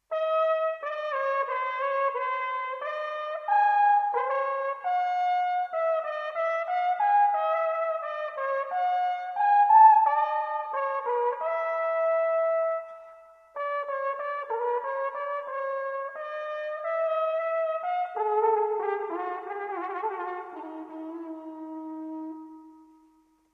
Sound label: bass